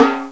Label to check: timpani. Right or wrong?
Right